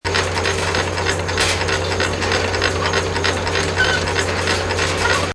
conveyor belt

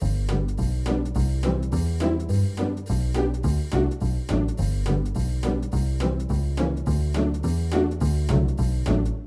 match bg; Poodletooth-iLand; Panda3D

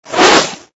throw miss; Toontown Online

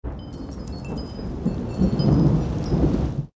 brainstorm

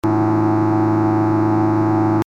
laser beam hum; toontown-ceiling

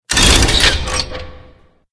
raise up; Poodletooth-iLand; Panda3D

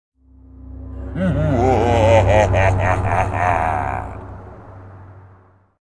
Devil Ray; toontown classic

